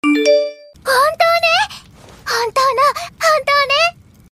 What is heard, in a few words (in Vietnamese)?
nhạc chuông thông báo anime